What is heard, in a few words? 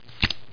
deal card